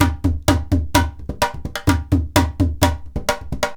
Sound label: IJEILU GROOV; Best Service; World Colours